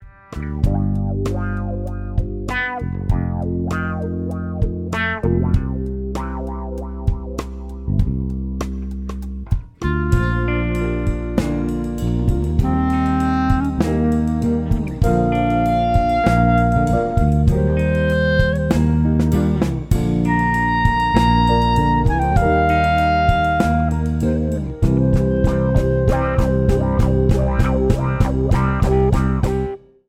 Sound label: Instrumental; Minneapolis